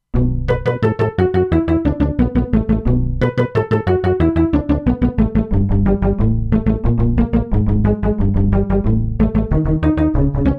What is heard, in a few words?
Pizz Moog Bass; Factory Presets; E-mu Proteus-2